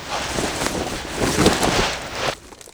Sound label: beartrap clothing rustle